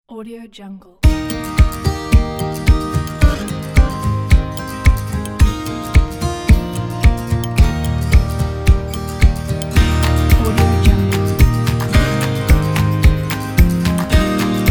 lazy morning acoustic

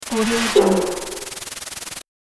static glitch technology

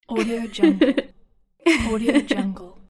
female giggle

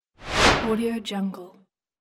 swish

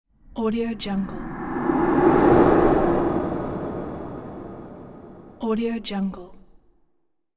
ghostly breath

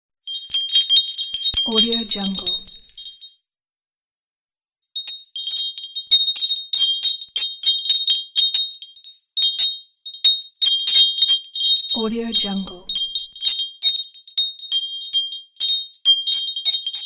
magical chimes and bells

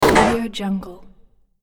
futuristic release hit

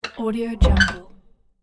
someone is entering

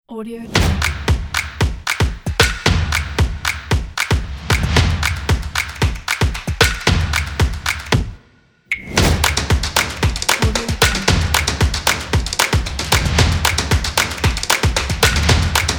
app promo

